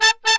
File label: MERENGUE, World Grooves